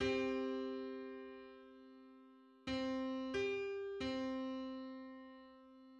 Seven-hundred-seventy-fifth harmonic on C

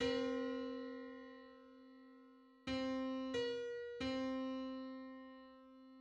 Hundred-seventeenth harmonic on C

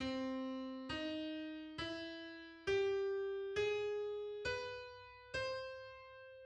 Augmented scale on C; MIDI

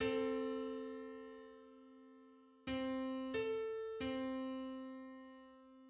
Two-hundred-seventeenth harmonic on C; MIDI

